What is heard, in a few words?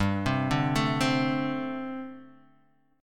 G Suspended 2nd Suspended 4th, Gsus2sus4 chord; arpeggiated; JGuitar